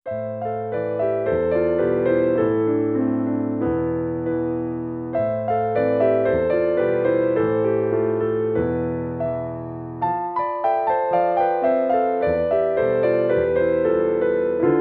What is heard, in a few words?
Etude danza